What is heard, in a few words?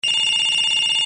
Ring